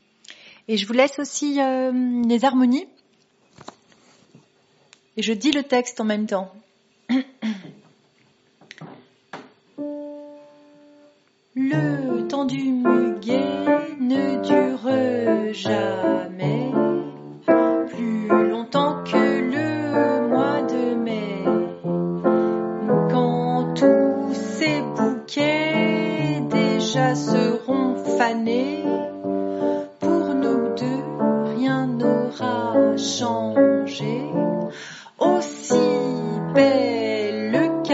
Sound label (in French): piano texte